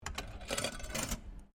SLOT MACHINE PENNY DROP, Various old coin slot machines; Casinos